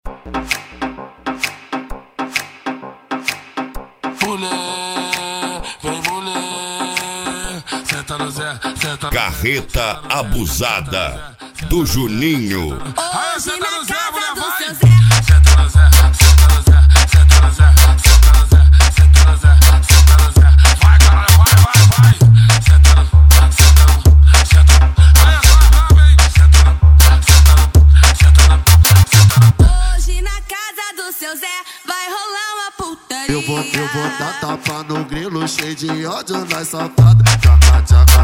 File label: mega bass